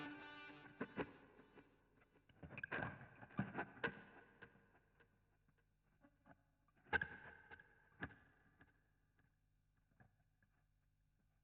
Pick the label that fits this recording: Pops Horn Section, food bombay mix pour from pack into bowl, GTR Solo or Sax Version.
GTR Solo